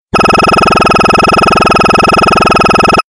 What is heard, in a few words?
Vibro Bleep